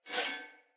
Split Sword Accent; Weapons; Player; Swords; Starter

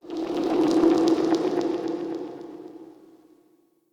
Magic Cave Texture; Fey Hub

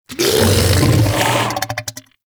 Vocal Burp Attack; Warframe; Hunting Critters; Undazoa

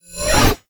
Bayonet Melee Combo; TnBayonet; Warframe; Tenno; weapons

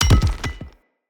Bomb Drop; Encounters; Ode Pollution Tower

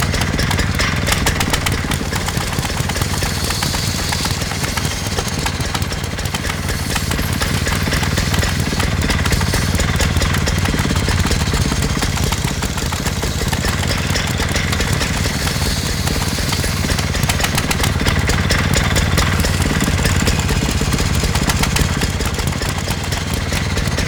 Chain Saw Tonfa Idle Loop; Warframe; Grineer

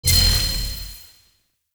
glyph correct; Warframe; Duviri; Glyph Puzzle

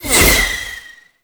Quick Strike Projectile Pass By; Enemies; Abilities; Warframe; Tau; Roathe

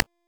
Shot Alt; Thanotech; Trumna Prime

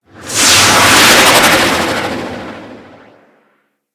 Jetpack Charge; Grineer Jet Pack Sisters

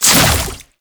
Entangle Cast; Warframe; Oraxia

